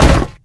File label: warehouse hard land; Original TK Sounds